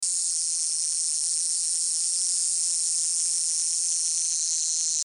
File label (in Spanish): cigarra